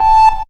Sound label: Hi Stab; Zero-G Jungle Warfare 1, 2 & 3